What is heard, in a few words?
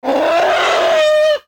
Pet Elephant Screams; creatures pets